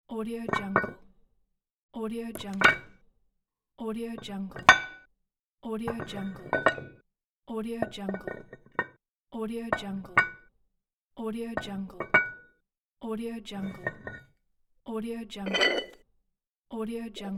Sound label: lifting barbell weights